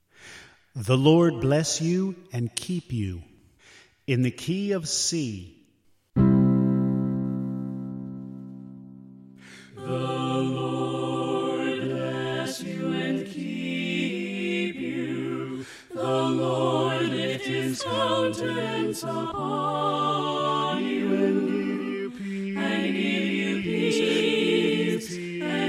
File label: Soprano Left